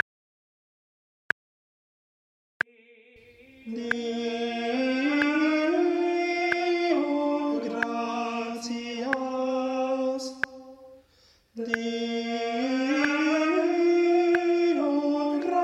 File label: Tenor Click 46 Minim